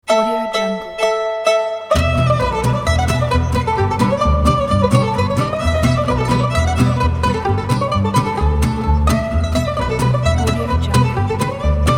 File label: fast country bluegrass; xg productions